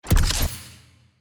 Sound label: ui archive grade